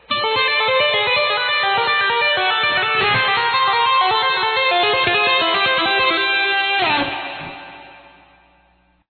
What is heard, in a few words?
tapping, guitar